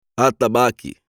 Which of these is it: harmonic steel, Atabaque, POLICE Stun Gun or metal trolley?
Atabaque